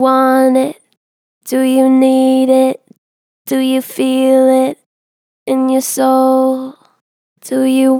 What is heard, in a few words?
Gentle Vibrato "Do you want it", Vocals, Natural Vibrato Gentle; Harmor Vocals